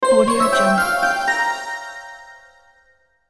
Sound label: mystical egyptian adventure